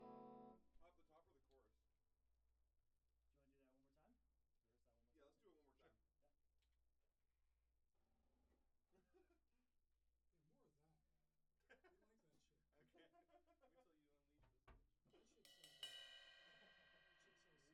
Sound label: Tom1